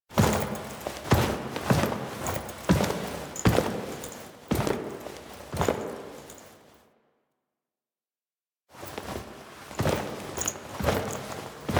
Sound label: Foley; Drifter; Warframe; The New War; Narmer Outpost